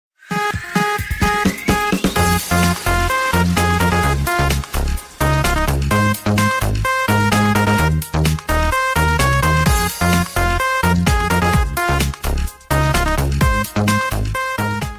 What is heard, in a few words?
Modern beats